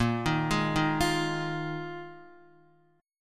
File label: A sharp 7th Suspended 4th A sharp, Guitar; arpeggiated; JGuitar